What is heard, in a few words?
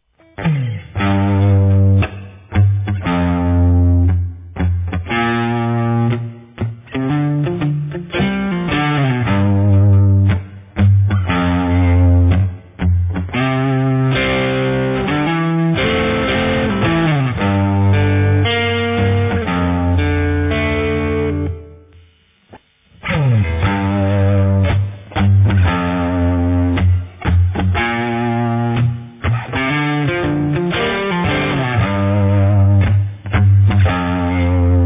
sample crunch